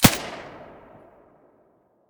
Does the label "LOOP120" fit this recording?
No